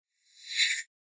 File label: Reverse Spell; SoundsCrate